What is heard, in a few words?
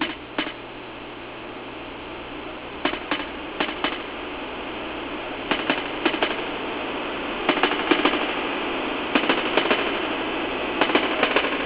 clik clak; ff6